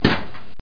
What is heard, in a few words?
door